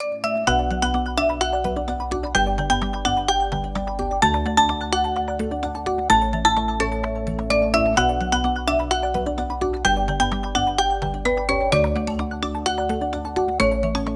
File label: Go Beyond Traditional, ringtones; Honor; 600 Pro China